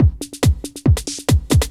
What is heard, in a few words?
techno 140